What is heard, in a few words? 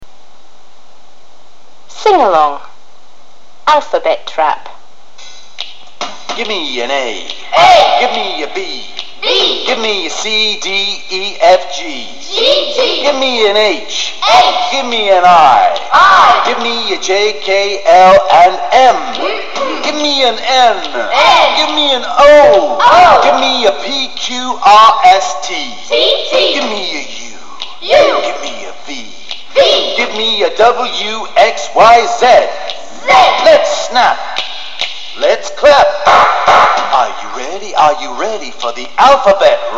Alphabet Rap